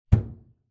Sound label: impact plastic hollow resonate large